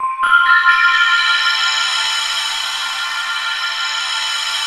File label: TRITON C5; Best Service Dream Experience; CONJURER 2